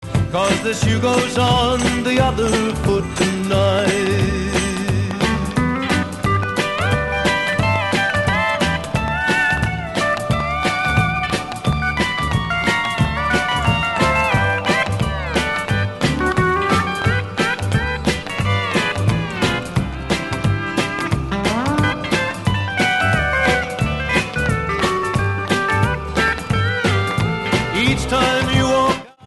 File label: steel solo